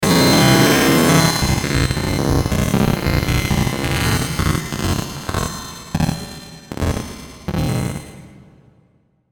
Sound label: Digital Electrical Sweeper Descend, Digital Distortion Beep Pan Delay; glitches; Blastwave